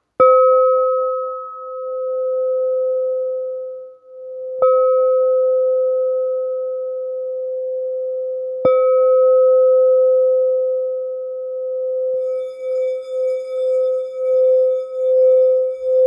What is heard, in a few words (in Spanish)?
Rojo do 515 hz, cuencos tibetanos